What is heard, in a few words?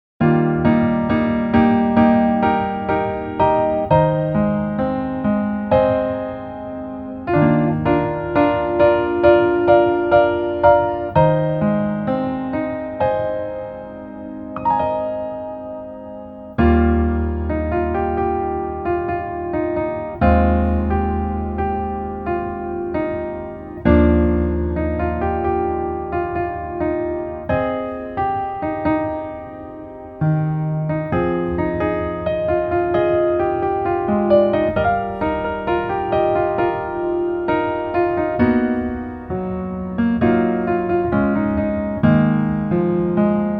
Piano